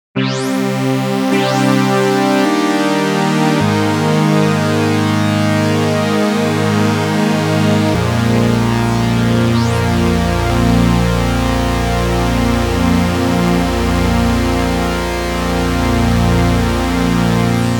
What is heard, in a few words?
oberheim bank; obx